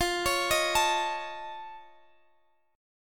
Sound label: F Augmented 7th, chord; F+7 arpeggiated; x,x,15,18,16,17; chord shape